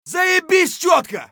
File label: scav cheer; eft